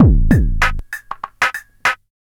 97.2 bpm, Breaks; BONITA; POTBreaks

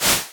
Percs, Elektron MachineDrum; SPS1 MKII

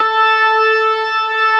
STOPS, ORGAN; Keith Emerson Signature Series

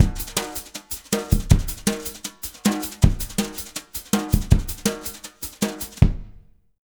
160 JUNGLE, Drumloops; Jazz Latin; USB Soundscan vol.08; AKAI

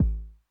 Kick Accent Mid Tone Mid Attack Max Decay, 909; classic machines

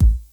ANALOG BD, DRUMULATOR; Crypton - DrumBase5000

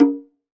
CONGA HI; EdgeSounds; Drum Mashines; R-8 DRUMS

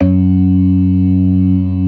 CLASSIC C3, Synth and Organ

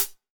HH CL, HR-16 Drum Mashines; EdgeSounds

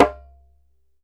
DJEMBE, African Perc

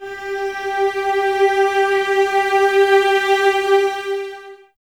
G 4, Cellos Expressivo; Miroslav Vitous; String Ensembles

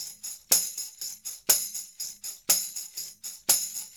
120 tam; Percussion

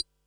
Perc, Percussion and FX; Pearl SC-40

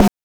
BRK TOM; NES DPCM; MIDINES

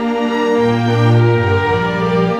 Cinematic Strings, Orchestral

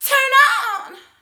VOC, Disco Fever VOCALS

